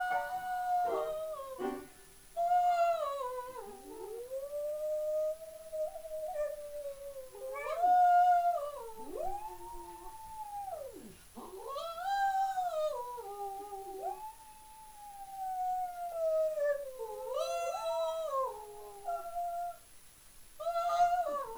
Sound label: singing